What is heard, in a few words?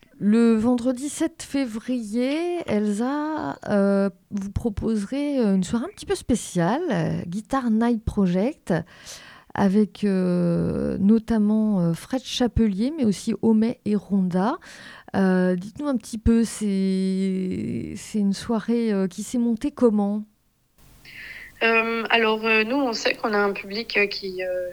Guitar night; Guitar night project